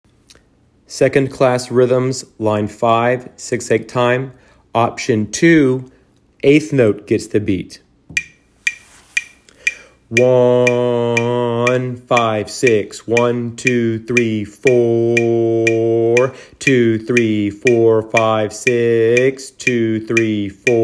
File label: rhythms eighth note